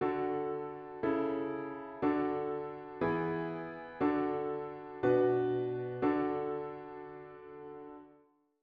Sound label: modal prog